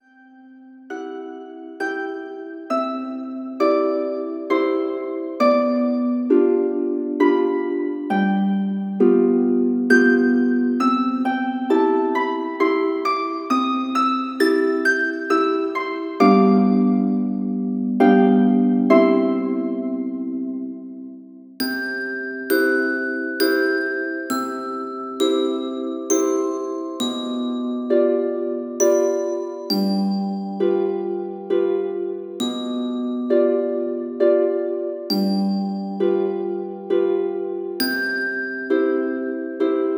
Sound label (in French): Berceuse Pour Bébé, Noctambule Reposant